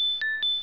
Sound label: turret whine